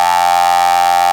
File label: RAVE BEEP; Best Service Alpha Dance vol.2